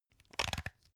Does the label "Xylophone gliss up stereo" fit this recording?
No